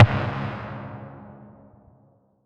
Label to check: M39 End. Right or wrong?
Right